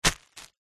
foley footstep single on plastic sheet

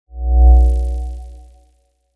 Synth Swell; noise creations

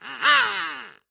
duck call vocalization ahahh mid triumphant; Skyclad Sound

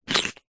human eat bite carrot raw crunch single; zapsplat

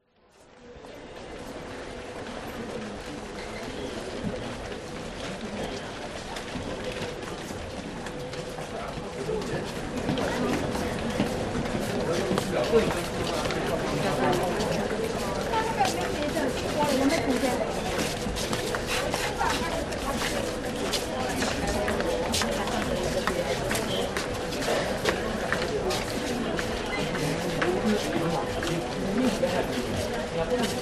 singapore passengers walk talk past; mtr

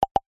multimedia notification pop message tooltip nudge

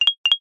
cartoon blink; zapsplat

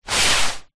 household umbrella closed slide ground

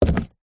cardboard shoebox empty set down; zapsplat

